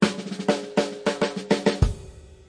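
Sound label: drum solo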